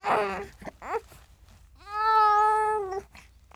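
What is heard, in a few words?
TALK, baby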